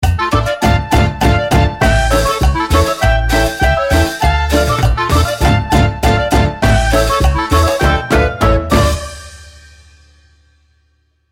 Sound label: Cumbia